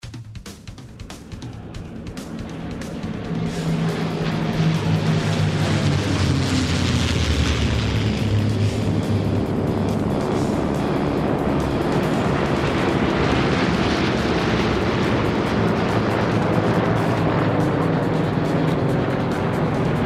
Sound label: WWII Fly Over; Full Mix